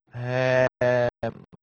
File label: hehehehheeeeeeee